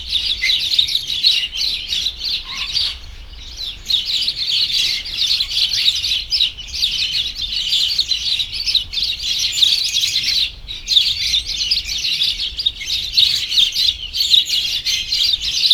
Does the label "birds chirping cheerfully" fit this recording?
Yes